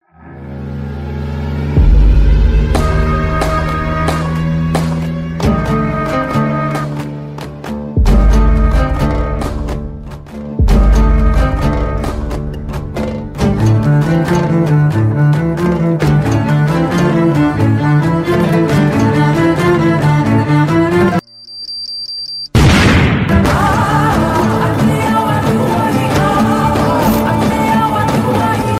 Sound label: short soft games music tone ringtone; galaxy ringtones